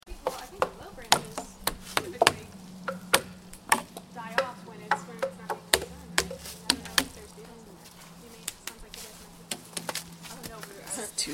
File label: AMBIENT SOUND TREE; WAVEFARM; ACRA